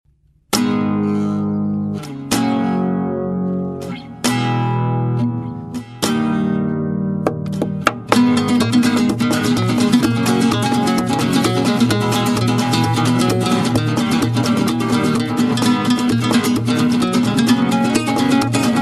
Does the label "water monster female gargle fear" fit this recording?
No